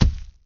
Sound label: Common Area Hit